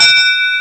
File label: bell